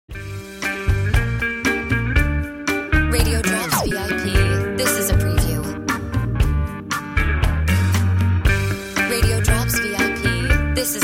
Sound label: Music Bed